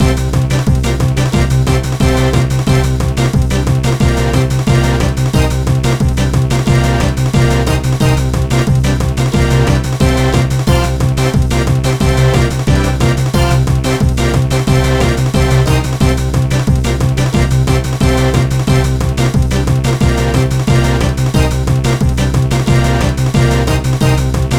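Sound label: background music; My Frogger Toy Trials; Nintendo DS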